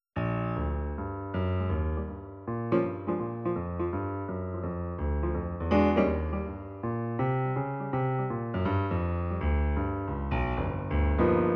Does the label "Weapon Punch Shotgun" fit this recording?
No